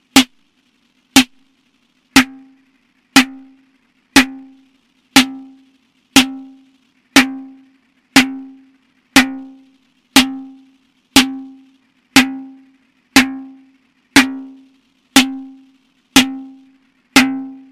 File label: Moogerfooger Drum Snare Delay; Amazona Moogerfooger Workshop